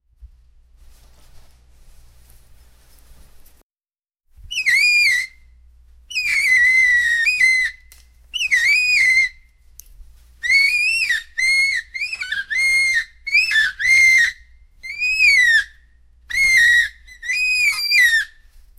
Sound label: angry chimpanzee noises; animal noises